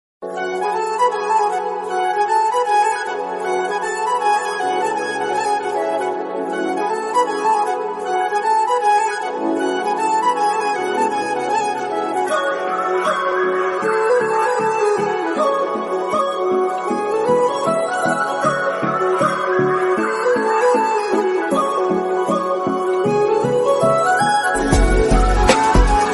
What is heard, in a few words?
background music ringtone; miss india movie